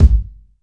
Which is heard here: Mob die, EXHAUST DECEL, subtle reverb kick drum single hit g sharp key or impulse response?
subtle reverb kick drum single hit g sharp key